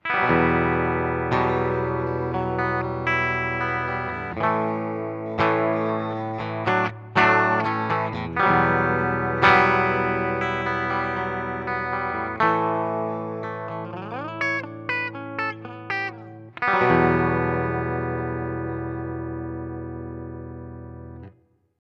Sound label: Cort X700 Bridge Humbucker Clean Split